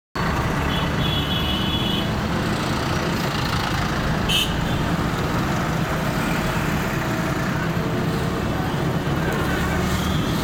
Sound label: Patan, Kathmandu, Nepal